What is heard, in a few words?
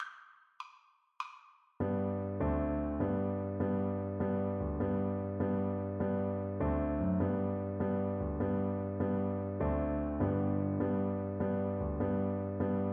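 jig VLC karaoke, Cello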